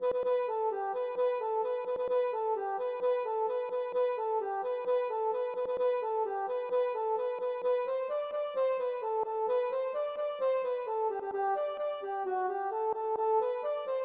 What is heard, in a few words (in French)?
pile menu